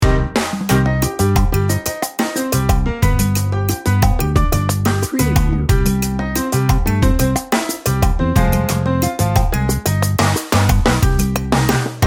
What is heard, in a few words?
salsa party 15 second